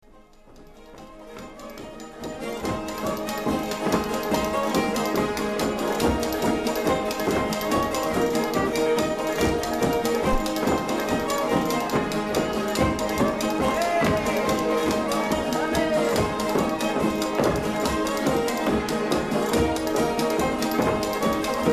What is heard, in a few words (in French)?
Gavotte